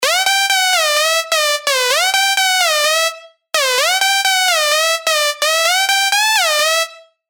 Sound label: Dirty Dutch Leads; Breezer Homies; Revealed Spire Dirty Dutch Leads Vol. 1